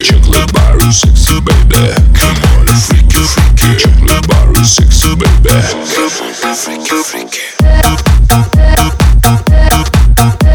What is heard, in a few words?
sexy sax